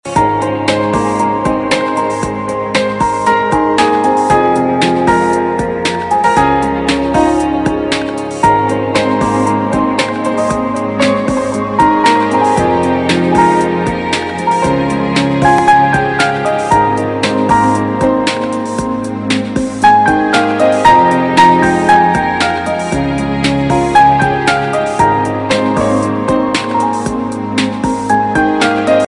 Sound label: Piano Ringtones